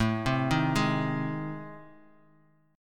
A Minor Major 7th Flat 5th A, Guitar; AmM7b5 arpeggiated; 4,3,3,1,x,x; 4F,4A,5C,5G,5C,6E

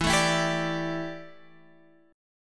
D Suspended 2nd Suspended 4th E

